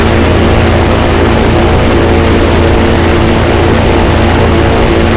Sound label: b25 rear, engines; War Thunder